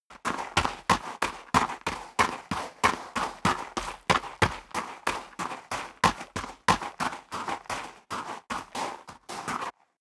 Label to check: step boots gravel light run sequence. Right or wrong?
Right